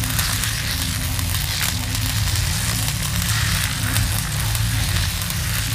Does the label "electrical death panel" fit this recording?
Yes